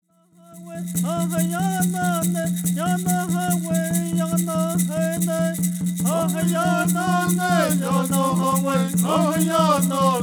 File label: Peyote Song